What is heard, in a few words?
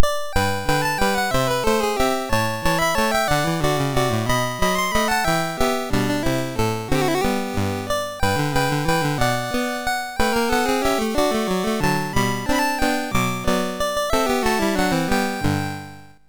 Season Jingles, MOS6581R3